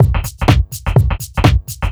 HOUSE BEATS 125

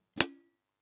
Snares & Claps, One Shots

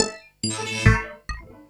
Industrial 140

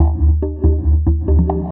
PERCLOOP TEKNO 140; Techno2

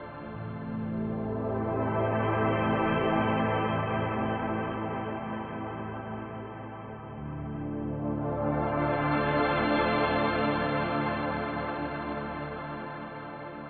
techno pad 140; Techno2; Keys

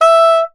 Soprano Sax Portato E4; Orchestral; DirectWave; Varazdin Orchestral

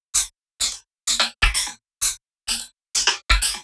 drum loop 128 Top; Electro; drum loops; Anthemic

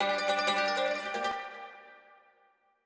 ethno, 160, C

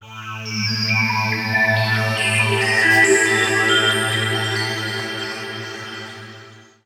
TECHNO PAD 140; Keys

